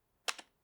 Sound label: keyboard key press; keyboard typing